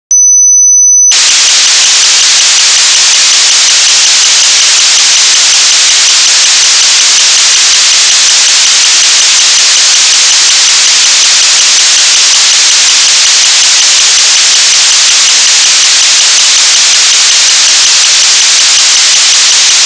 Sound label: GHOST eurorack ext clock